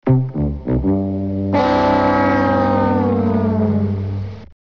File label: lose; flood; sledbuild; race